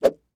miss; weapons

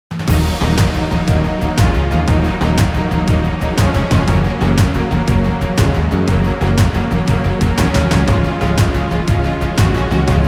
combat

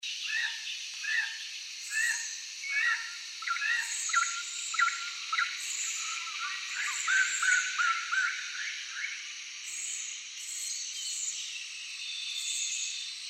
jungle ambience

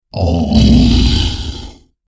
pain, troll

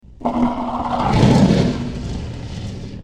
breath, dragon green tiny

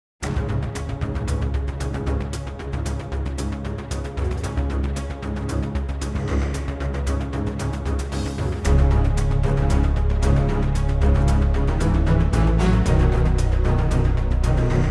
combat; music

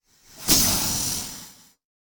fail

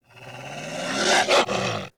wolf

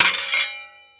sword strike; weapons

